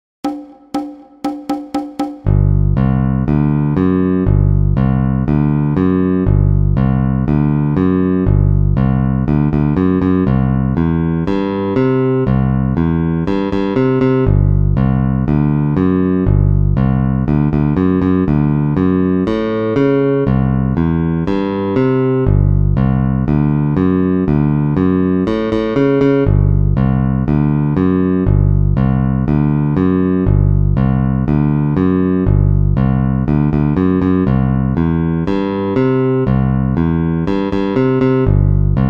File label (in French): 12 Barres Blues Basse Metronome 4x4 120BPM